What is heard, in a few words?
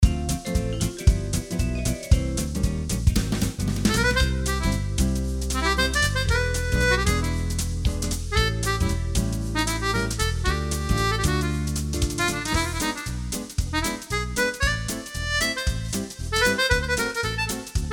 Jango Jazz; AiR Symphony